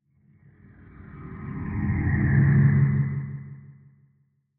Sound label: Sci Fi Levitation Levitate